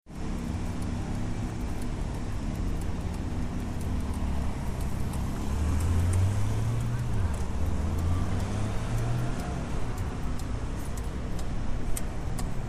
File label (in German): akustisches ampelsignal an der haltestelle; suevenstrase; koeln